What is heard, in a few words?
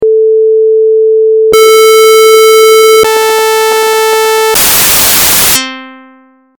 sine, square, sawtooth, rando